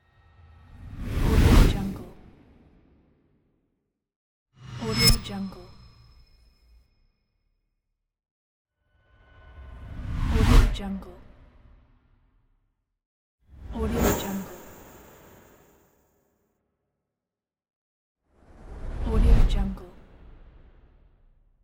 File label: swoosh; dauzkobza